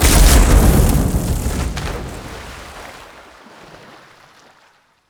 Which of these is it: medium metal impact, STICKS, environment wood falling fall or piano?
environment wood falling fall